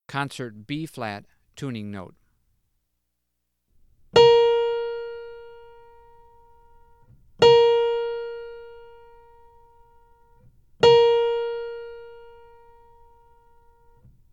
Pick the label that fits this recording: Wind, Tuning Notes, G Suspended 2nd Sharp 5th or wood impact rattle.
Tuning Notes